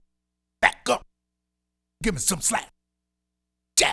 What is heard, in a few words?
Vocal, 70s Analog Funk